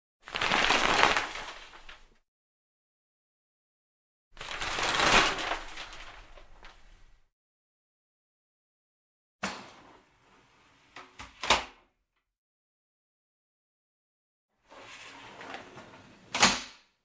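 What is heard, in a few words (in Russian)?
занавеска душа открывается закрывается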